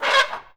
PULL, Anime Sound FX; COMIC

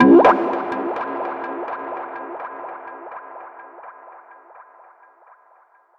fx falling

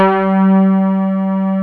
C5, FM PIANOS

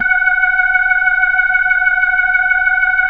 F#5F, B3, Vintage Keyboards

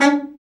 BRA BARI, Bariton Sax

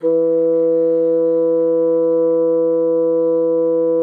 bassoon, E3; Xsample VOL-6

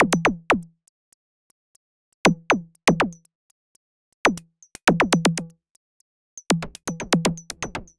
Subtractive Beat

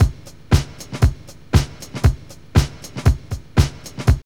DISCO LOOP; Zero-G; Funky Elements

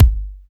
KLICKY KICK; Drums In Your FACE!

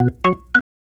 Organ Lick; Ambient Grooves; Organs Licks

